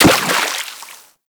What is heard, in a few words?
Operator Run Water, Footsteps; Warframe